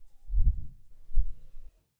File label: Woosh